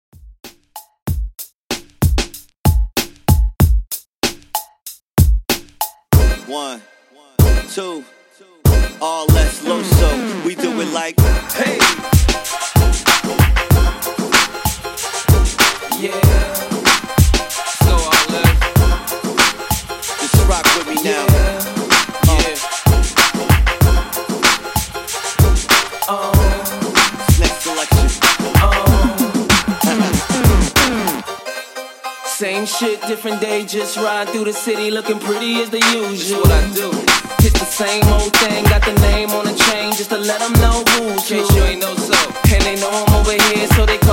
Old School Redrum 95 Bpm Dirty

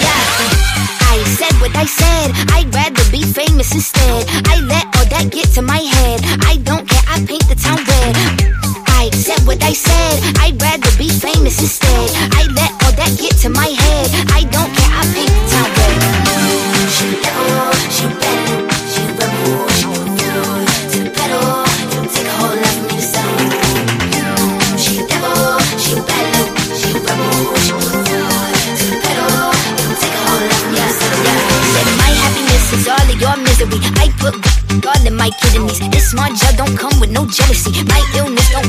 Disco 122bpm Gm

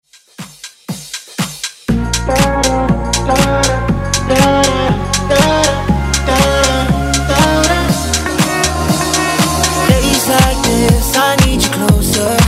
Gone House Redrum Intro

